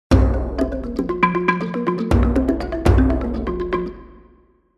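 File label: game background music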